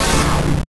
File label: Glitch Sound